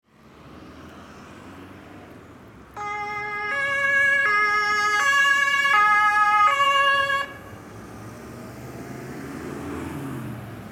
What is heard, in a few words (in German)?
Polizei